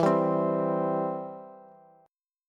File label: E-Minor Sharp 5th, Bass; x,19,17,17